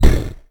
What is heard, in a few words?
stone golem move; Crypt of the NecroDancer